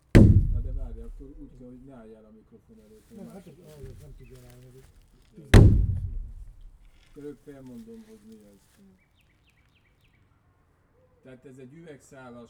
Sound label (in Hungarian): műanyag medencébe való belerúgás